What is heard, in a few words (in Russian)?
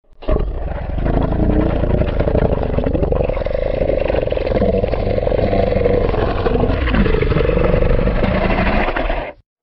cartoon dog ryk